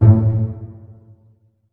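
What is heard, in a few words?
string pizzicato A1 mezzo forte right; Sound On Sound - Peter Siedleczek's Orchestra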